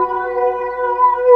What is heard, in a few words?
Pad; Blu Mar Ten; Jungle Jungle - 1989 to 1999 Samplepack